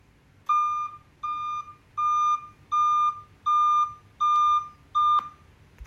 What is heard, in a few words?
reVerb Sound; etbass2000